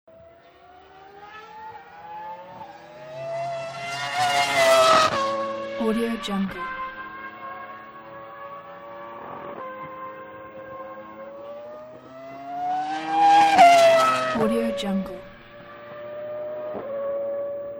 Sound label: formula 1 cars pass